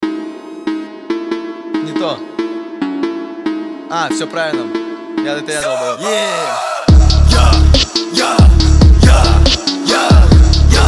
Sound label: phonk; rap